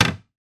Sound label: household bread bin lid wooden close; sound effects one